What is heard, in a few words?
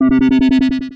Activated Button